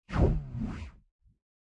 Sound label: Board Upgrade; Subway Surfers City Sound Effects; Android